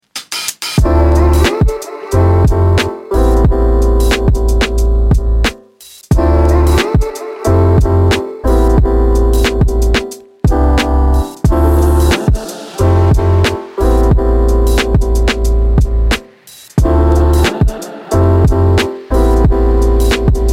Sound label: music